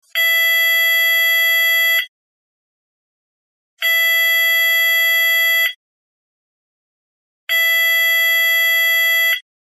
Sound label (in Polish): ton